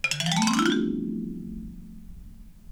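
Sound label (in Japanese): mokkin, 楽器音; 木琴; 木琴の音(立体音響)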